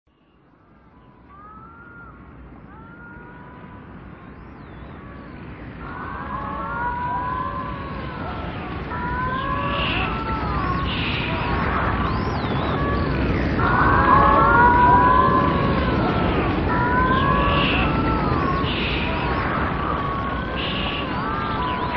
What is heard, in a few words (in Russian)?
delfiny